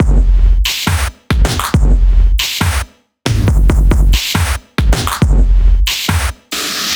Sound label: Bpm138 Loud Break, Dubstep, Drum Loops